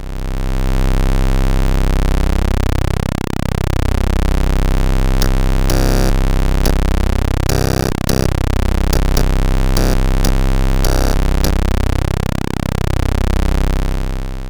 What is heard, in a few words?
Beats tune, sid CSG8580R5